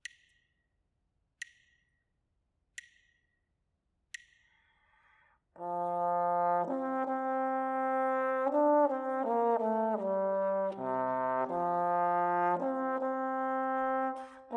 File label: melodic dictation; ear training